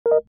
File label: mic on; mail; odoo